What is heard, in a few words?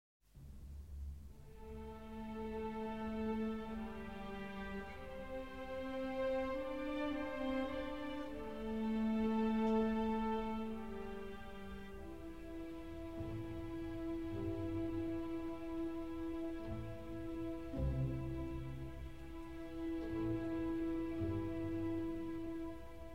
Danses pour harpe et cordes, Danse sacrée; Debussy; CD 113, L. 103 No. 1